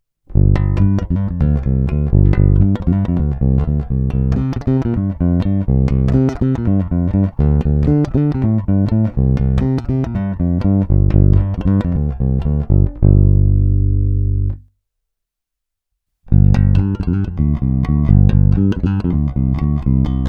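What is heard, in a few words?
Jazz Bass; Sadowsky NYC Ultra Vintage J Bass Guitar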